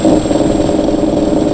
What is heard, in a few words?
ELEVATOR; INC demo